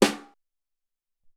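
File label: Brush Snare 1, Alesis SR-18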